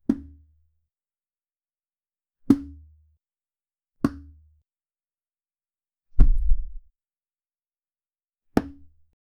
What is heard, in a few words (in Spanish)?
soltar objeto al suelo, object drop